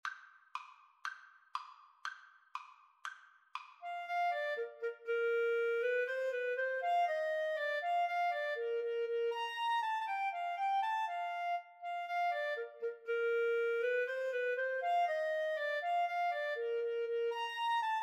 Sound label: Clarinet Cello Duet